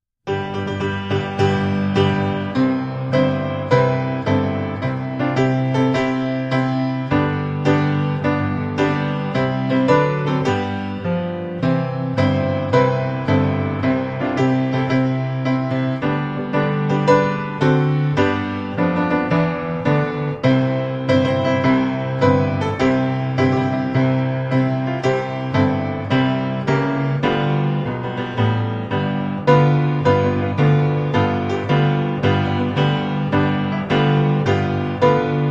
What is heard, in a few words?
beautiful piano solo performance; called to serve